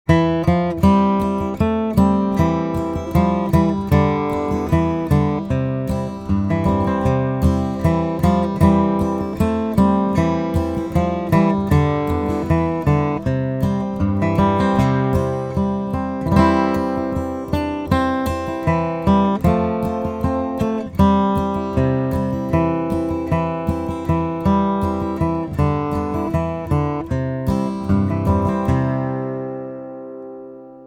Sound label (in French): Country, Guitare Country; methode guitare country